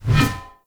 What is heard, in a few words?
Shield Whoosh; Weapons; Enemy; Shields; Sinecure Shield